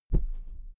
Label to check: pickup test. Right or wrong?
Wrong